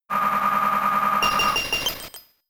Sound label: Icy Wind; attack moves; Pokemon; Gen 4; DPPL HG SS